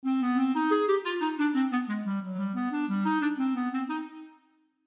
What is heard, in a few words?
Clarinet; examples in treble clef